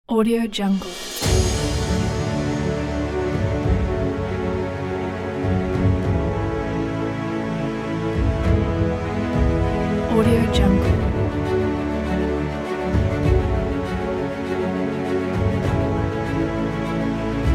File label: romantical inspiration epic